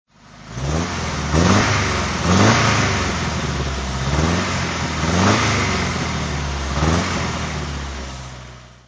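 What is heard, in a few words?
mb 190sl throttle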